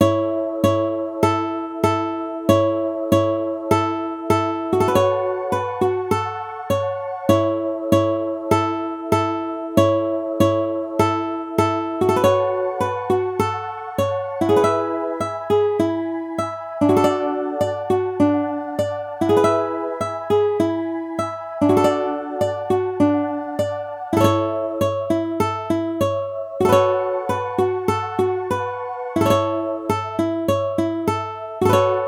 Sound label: Ukulele Arpeggiator A Major